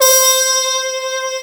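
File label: muted trump C6, muted trumpet; Star Trek JR Audio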